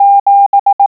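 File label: SEVEN, morse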